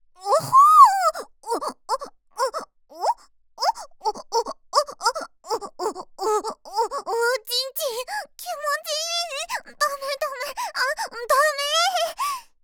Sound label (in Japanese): おほぉっ！♥おっ！♥おっ！♥おっ！♥